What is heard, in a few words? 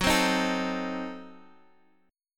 F sharp Diminished 7th Guitar Half Step Down